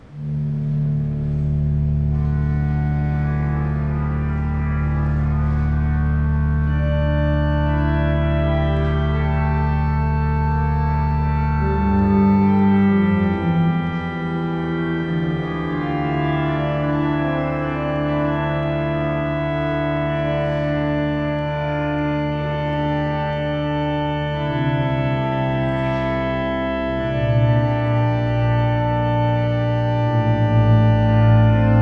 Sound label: Organ interlude